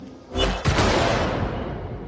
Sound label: sniper; darktide